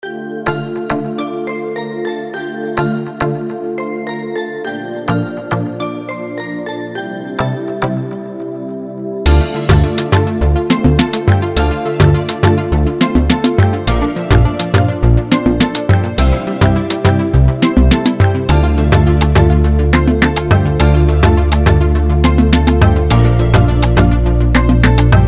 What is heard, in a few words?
Dancehall